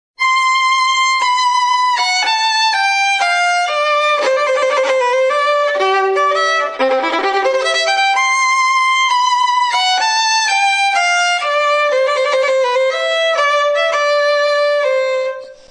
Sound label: Wedding March, violin